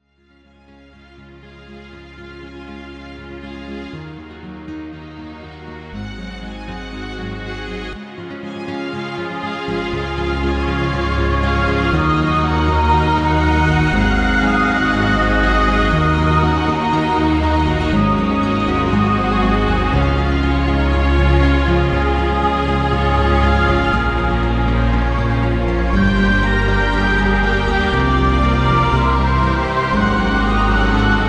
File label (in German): astro klang hintergrund musik